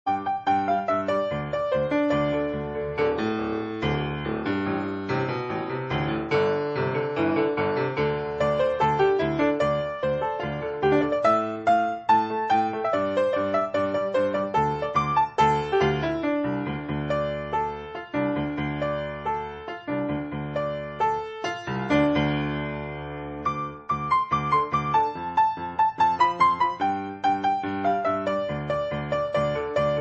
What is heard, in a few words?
grand piano; Druid